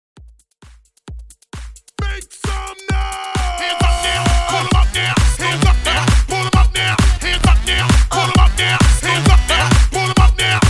XMAS REDRUM, 132 BPM

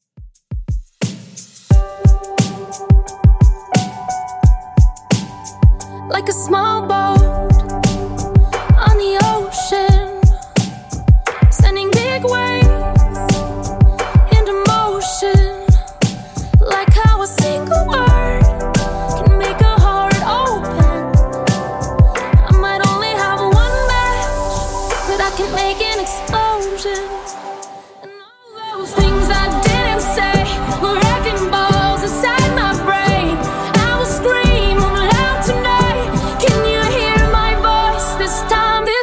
Top40 Redrum 88bpm; remixplanet

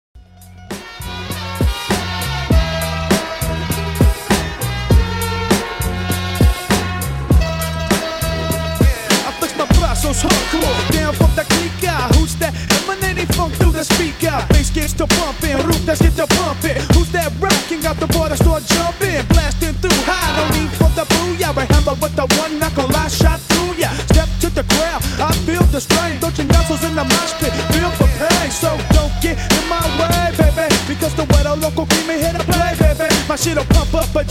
90s Redrum 100 Bpm

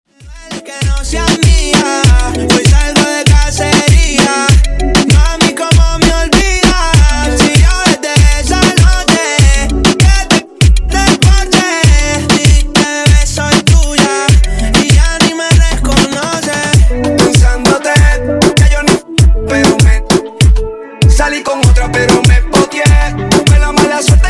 98Bpm; Dj Intro Outro Edit

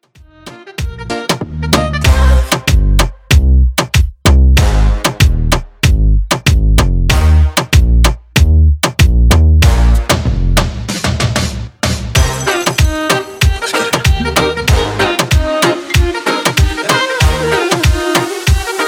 Uptempo Moombahton 95bpm

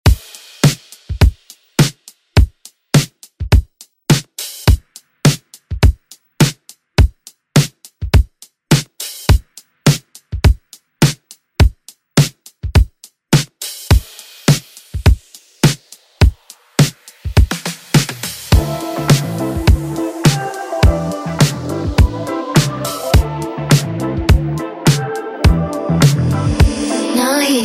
ReDrum; DJ Mhark

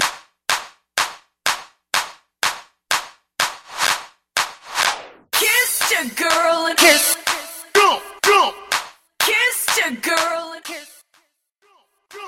EDM Bootleg Mash Up Party Starter 124 Bpm

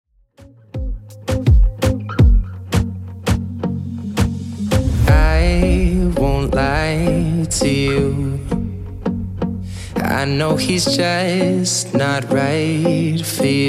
83bpm

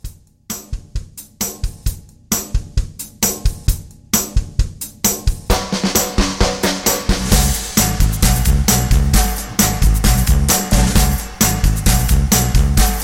60s Soul Re Drum 132

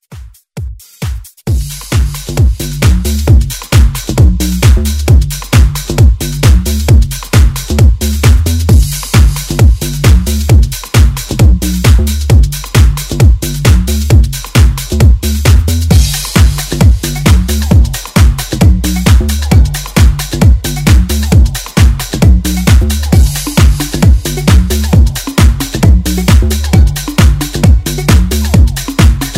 Dance Redrum

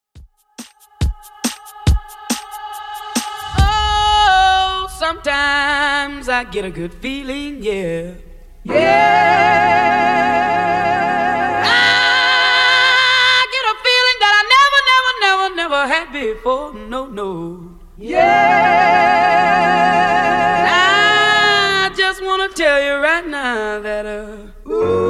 60s Redrum 140bpm

